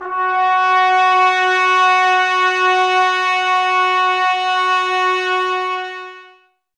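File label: brass trumpets F; 90 s Sample CDs; Miroslav Vitous; Woodwind Brass Ensemble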